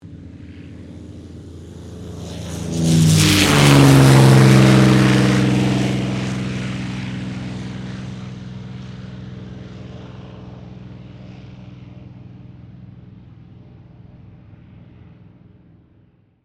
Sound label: AIRCRAFT SUPERMARINE SPITFIRE MK-VIII TAKEOFF; Airplanes; Vehicles; Airplane Sound Effects